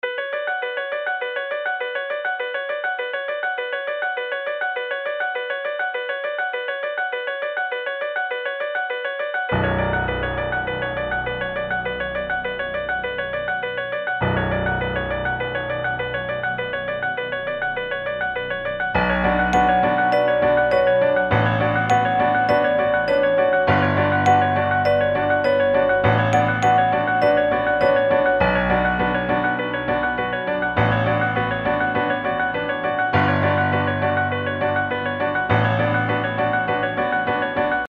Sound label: B Minor 203BPM